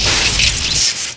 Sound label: Magic; Majesty; OnlineGame